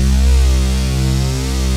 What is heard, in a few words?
TECHNO; Pro-Rec - Synthsonic; Z1 TECHNO